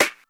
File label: high quality sharp snare c sharp key; snare drum samples